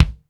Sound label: HI EDGE DRUM; 90 sSampleCDs; Crypton - DrumBase5000; HED.K